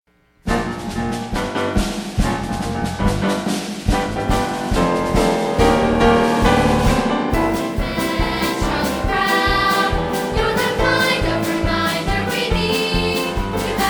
choir; Nashville

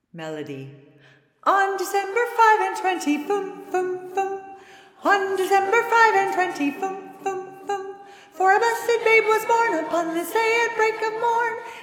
melody

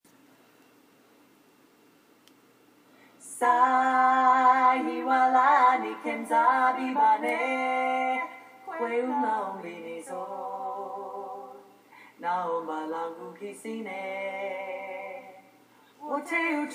bolero alto theme

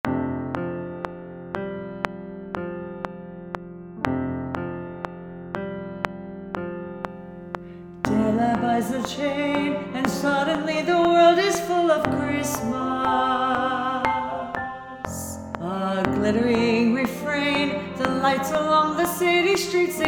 tenor

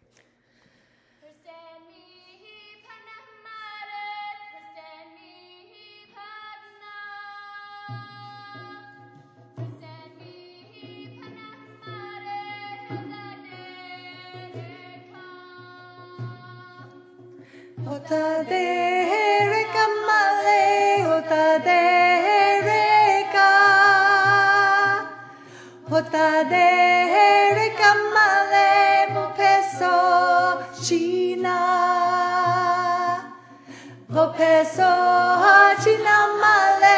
soprano

